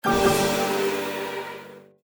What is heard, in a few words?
Combo Boom; Kung Pow